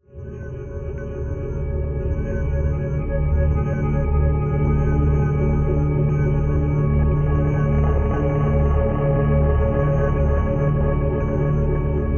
Crystal Rain

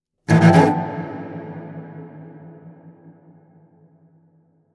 Cello

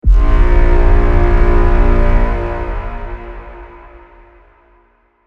Braam Bb

